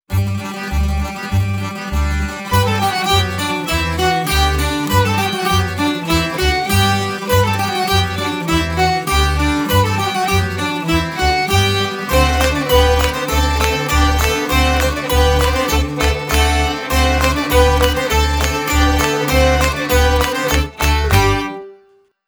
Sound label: Skips, exam music